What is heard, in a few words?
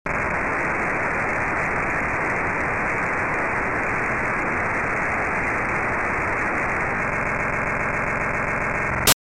P25 Encrypted Voice; NFM